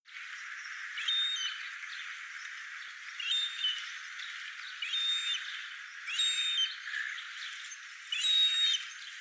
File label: iceland gull